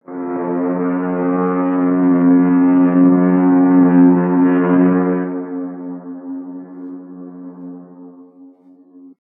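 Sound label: liner horn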